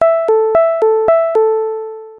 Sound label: warning